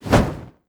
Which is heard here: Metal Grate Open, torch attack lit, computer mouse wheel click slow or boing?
torch attack lit